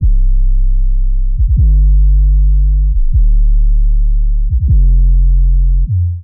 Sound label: Bass D Minor 154 bpm; MIDI Clips; Skitter and Step; Drifting Back